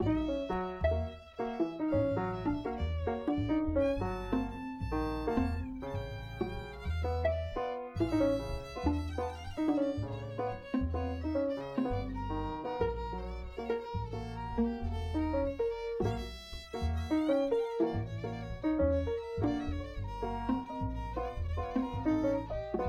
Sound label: Quartett